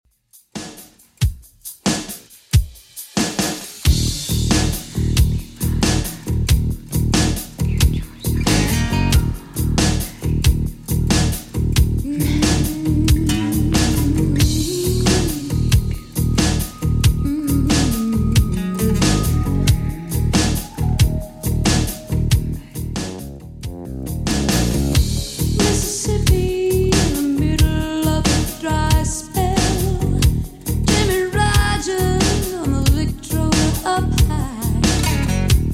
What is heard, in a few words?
90s Redrum 91bpm